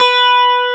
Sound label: GUI STRATO, E-Guitar; E-GUITARS